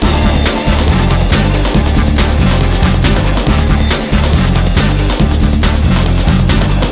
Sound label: moog